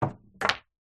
sport pool ball pit into net with other balls inside